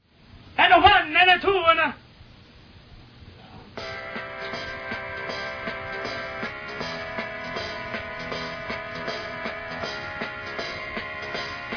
Whistling Song

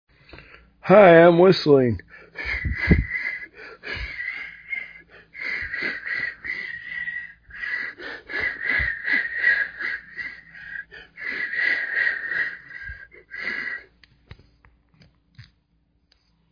Whistling

